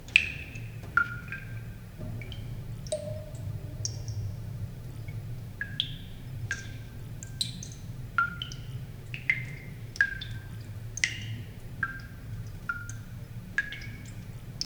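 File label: the sound of water dripping, creepy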